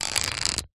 industrial clip spring loaded plastic open creak

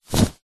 foley flag handling movement single shake flap; zapsplat

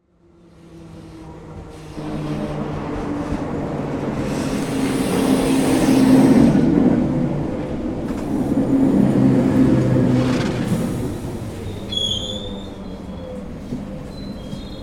Train, arriving

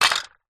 scooter childs metal drop or set down on concrete

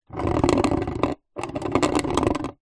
wooden box small empty slide drag rough concrete; foley

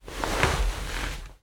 household chair dining bottom movement

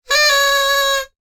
whistle party noise maker; foley; sound effects; sound effects one

